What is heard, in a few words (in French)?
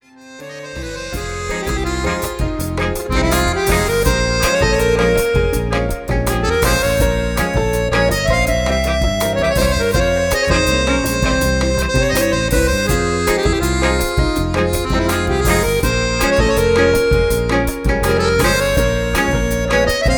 rumba